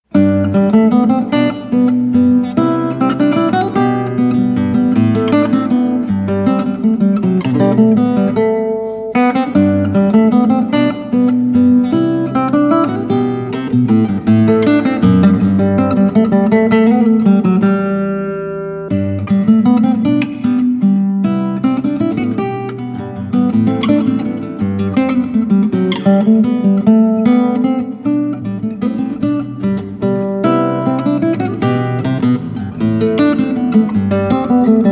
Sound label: minuet, classical guitar